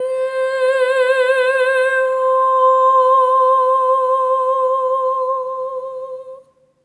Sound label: EH OH C5, SOP; Spectrasonics; Symphony of Voices